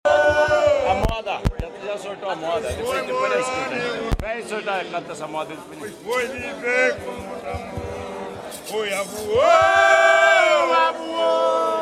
batuque de umbigada tiete no dia treze

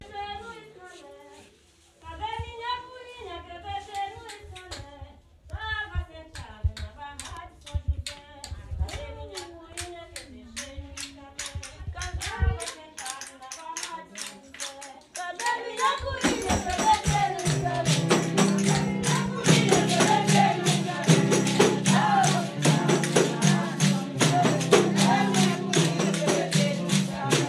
fanti baiao de princesas

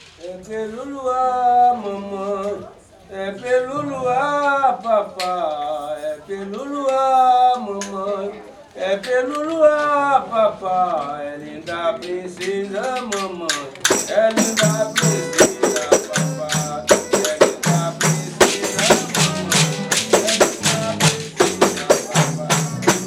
fanti baiao de princesas pelo luar; Baião de Princesas é um ritual especialíssimo e único que acontece apenas uma vez ao ano

